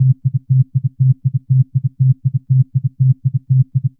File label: Synth